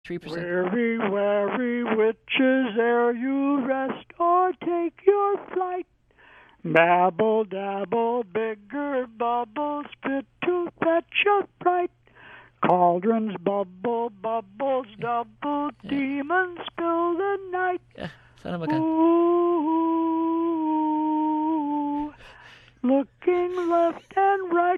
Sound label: a halloween song